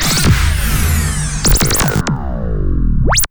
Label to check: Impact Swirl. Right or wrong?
Right